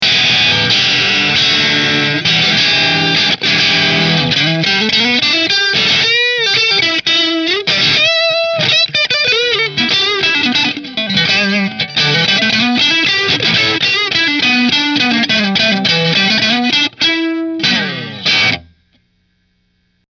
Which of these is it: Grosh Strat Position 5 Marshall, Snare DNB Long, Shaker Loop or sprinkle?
Grosh Strat Position 5 Marshall